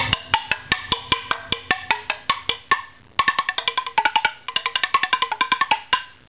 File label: turtle drums